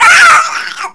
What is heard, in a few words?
death; alienarena; xeno